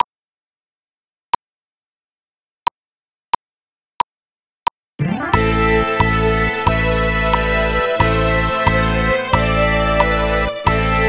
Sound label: Rock Keyboard